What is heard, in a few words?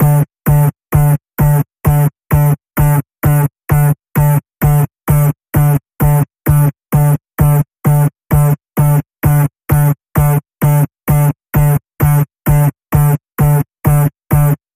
Digital Rewind Pulse, D